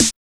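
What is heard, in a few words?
HOUSE SNARE DRUM; E-Lab; X Static Goldmine 1; akai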